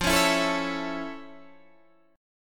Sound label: F Augmented Major 7th F Guitar DADGAD